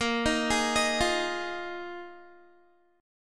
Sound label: A sharp 7th Suspended 4th down Guitar 4F 4A 5C 5G 5C 6E; A#7sus4; arpeggiated